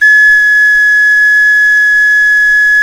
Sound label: CLAYFLUTE, Heart of Africa; Spectrasonics; Heart of Africa 1